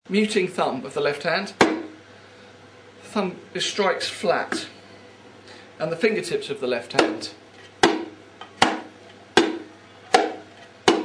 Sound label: MUTE STROKES, bongo